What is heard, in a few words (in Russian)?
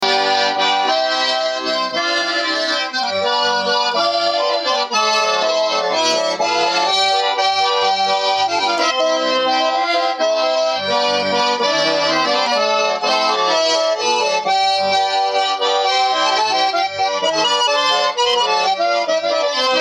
гармошка, гармонь ручной работы; Продам гармонь, 1976 года, ручной работы, в хорошем состоянии; Русская гармонь